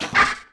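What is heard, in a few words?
attack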